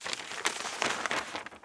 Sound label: paper; PHARAOH